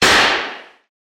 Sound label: Gun Shot; SoundsCrate